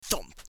Thump; Assemblee SFX

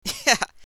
laughter; Assemblee SFX

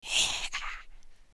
hiss; Assemblee SFX

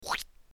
Splat; Assemblee SFX

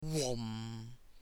Whum; Assemblee SFX

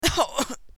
Pain; Assemblee SFX

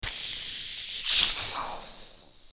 Launch; Assemblee SFX

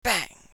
Bang; Assemblee SFX

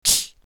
crack; Assemblee SFX

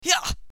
Hyah; Assemblee SFX

